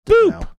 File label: boop